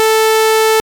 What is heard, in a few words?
saw f440hz